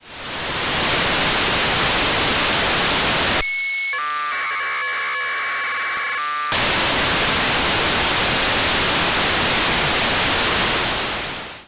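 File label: ROBUSTA 1B, satellite; AIRSPY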